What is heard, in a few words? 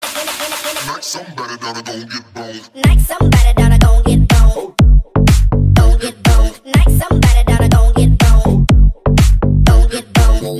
remix bass boosted